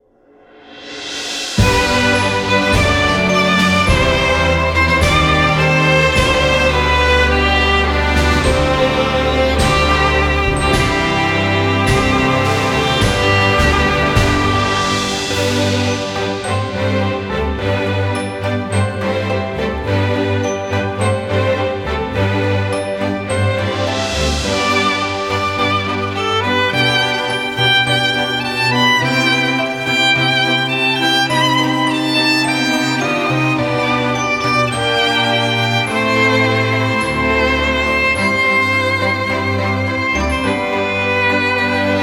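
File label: background music town steam floating city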